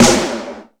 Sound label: Lazer Drums, drum machine